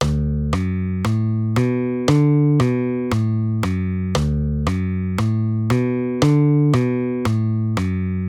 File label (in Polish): Figura tony D Fis A H d H Fis A